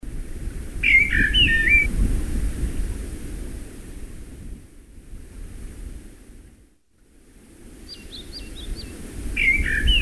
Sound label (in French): Brise avec oiseaux